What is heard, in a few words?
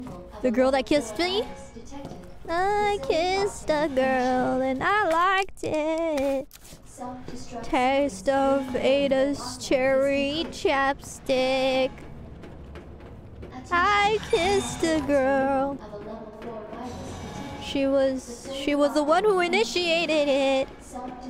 Singing; IRySinging